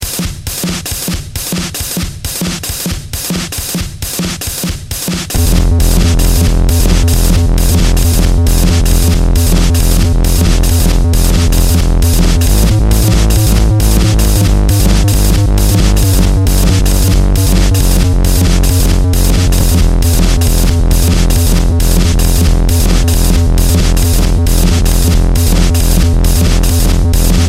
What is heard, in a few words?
Valse